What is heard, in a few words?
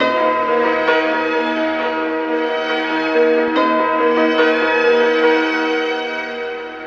piano and strings, Sad; loops